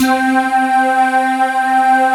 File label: VP1, PAD; Alpine Volksmusik